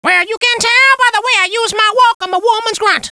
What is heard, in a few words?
Womans Grunt; Well you can tell by the way I use my walk I'm a woman's Grunt; Gruntz; Disco